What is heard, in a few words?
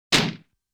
Cake bomb burst sound; dondokodon